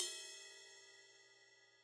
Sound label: Ride; apulSoft apTrigga2; Multi-Velocity Cymbal Samples